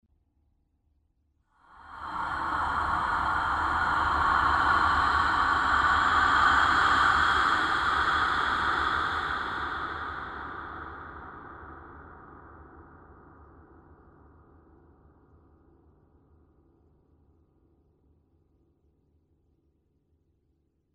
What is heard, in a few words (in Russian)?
blizkoe dyhanie